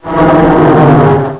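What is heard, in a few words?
dino roar; Pamela Anderson Interactive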